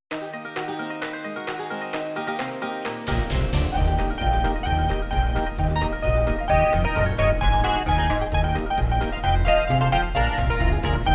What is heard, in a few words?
jingle bells